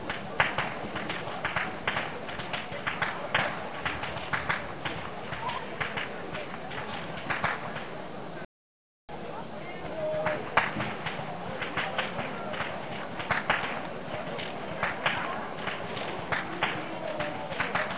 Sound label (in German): schnalzen, jugend